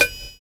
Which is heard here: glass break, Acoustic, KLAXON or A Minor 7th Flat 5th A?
KLAXON